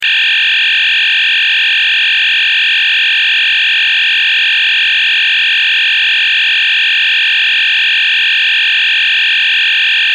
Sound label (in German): Ton